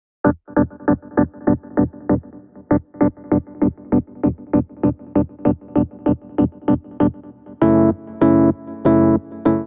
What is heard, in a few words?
Muddy Whirl Piano E Minor 85 bpm; MIDI Clips; Accompaniment; Ableton; Chop and Swing